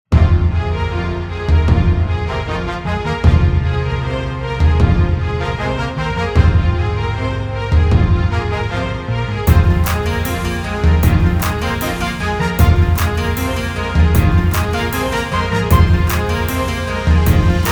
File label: Indian Patriotic Background Music; indian patriotic music pack vol 01